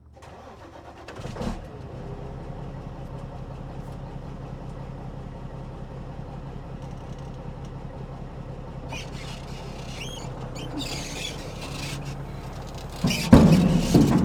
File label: Semi Truck; Blastwave; Large Vehicles